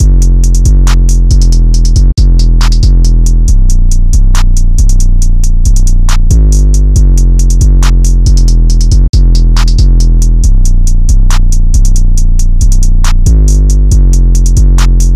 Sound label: drums, 138bpm, A minor